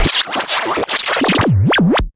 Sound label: Abrupt Garble Burst, Taylor Grand